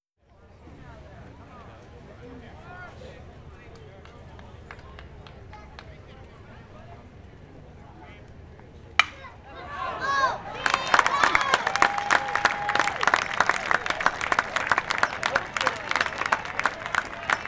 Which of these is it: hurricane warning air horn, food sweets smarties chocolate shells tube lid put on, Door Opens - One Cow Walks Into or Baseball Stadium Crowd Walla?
Baseball Stadium Crowd Walla